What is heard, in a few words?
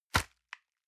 envelope grab hard